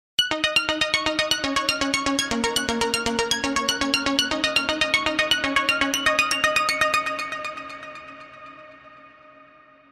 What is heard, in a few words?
Electronic Ring